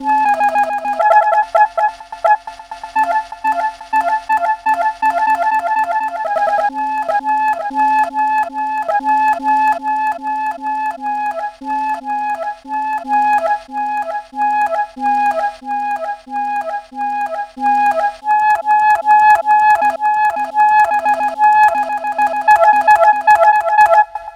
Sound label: sweet sax loops